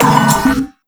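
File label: Bello Oneshot, beats; Sonic Foundry (Sony Creative Software); Esoterik Beatz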